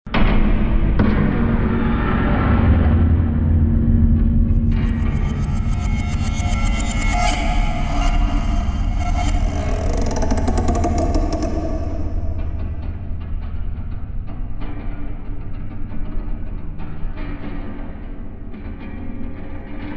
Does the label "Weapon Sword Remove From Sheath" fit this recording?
No